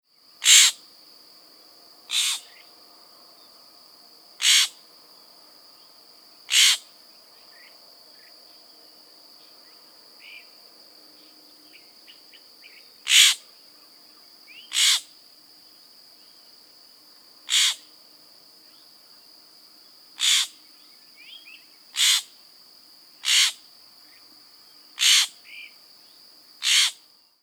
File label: Florida Scrub Jay Scold calls